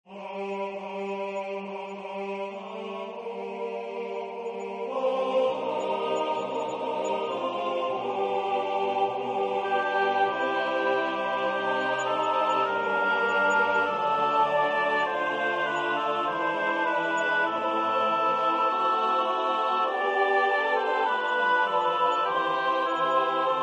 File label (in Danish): Skal blomsterne da visne - SATB